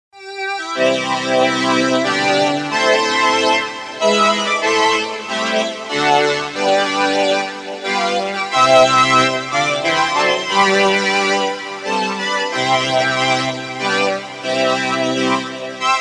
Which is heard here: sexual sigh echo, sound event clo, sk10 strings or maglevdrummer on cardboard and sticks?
sk10 strings